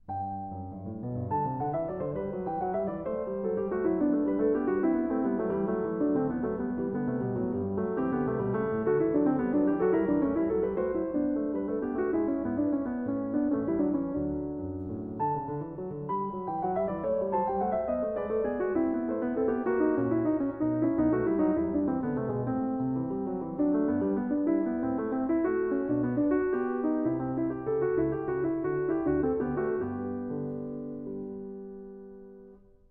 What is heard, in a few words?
Goldberg Variations, Variation 6 a 1 Clav. Canone alla Seconda; Bach; The Goldberg Variations, Zenph Re-performance; BWV 988